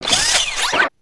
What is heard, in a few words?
leg servo, creatures mechanical